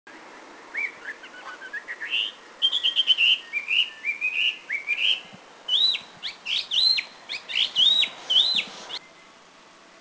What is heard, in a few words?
Taiwan Hwamei; Garrulax taewanus Country endemic; en-route to Huisun; 1 bird seen at a roadside stop en-route to Huisun